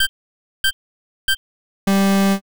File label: cd digital